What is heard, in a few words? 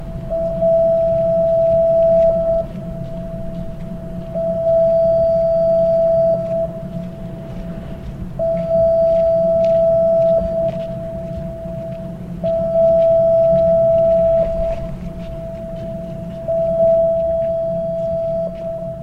Outdoor Warning Siren